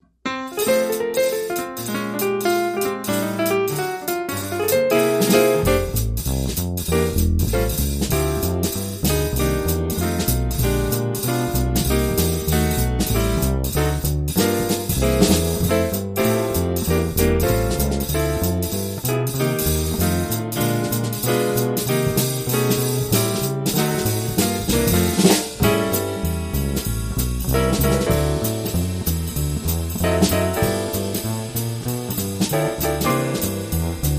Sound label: Rhythm Only